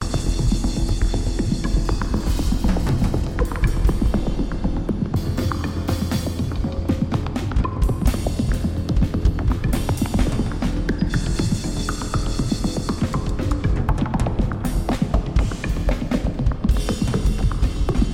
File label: Drums